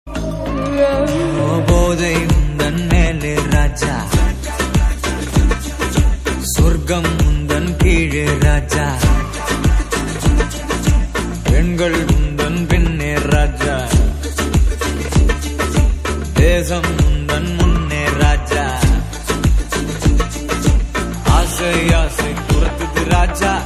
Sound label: Tamil; Nota